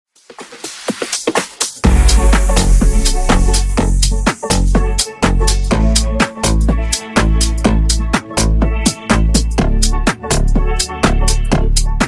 Funk Remix 124Bpm

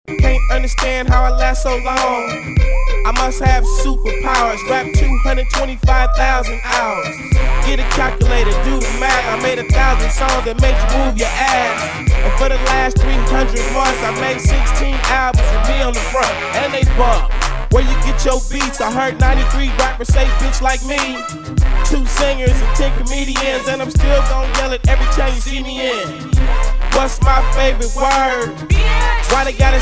Club Party Hip Hop Mash Up

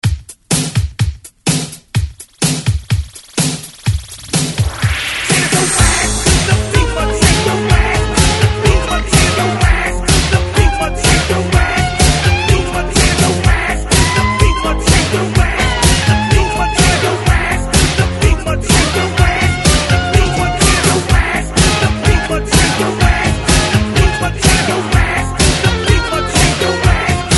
Hype ReDrum

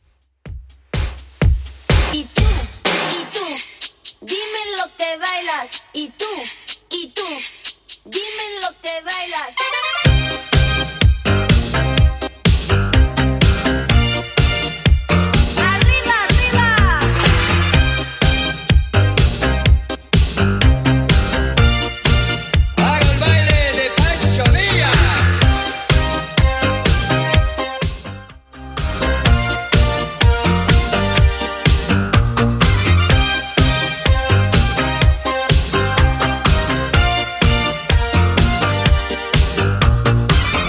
80s Redrum 125bpm; Pancho Villa

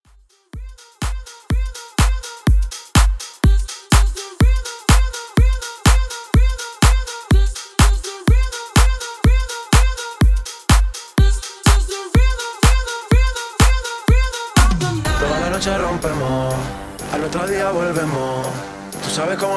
Re Drum 124bpm

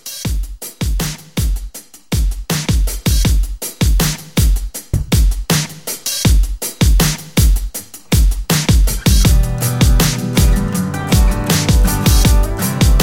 Country Re Drum CLEAN

